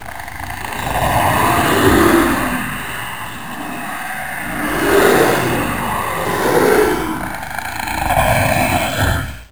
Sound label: Tyrannosaurus Roar; Saban